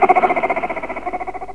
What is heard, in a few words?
ANIML; Zero G; Man Machine